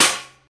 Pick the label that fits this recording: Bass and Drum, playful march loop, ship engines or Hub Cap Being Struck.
Hub Cap Being Struck